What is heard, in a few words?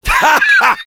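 laugh short, French; Rire court 1; Heavy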